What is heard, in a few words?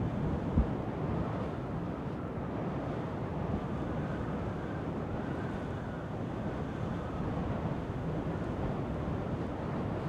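Ambience Red-Corridor Wind Loop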